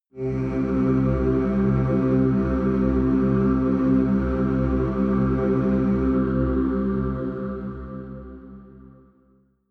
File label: demon choir one note low; music elements